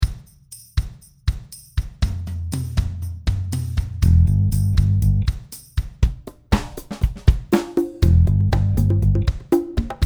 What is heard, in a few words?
bass